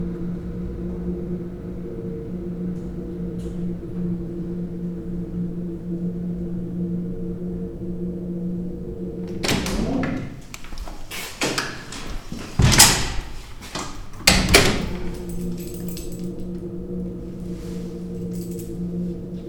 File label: Wind Howling With Door Opening Interruption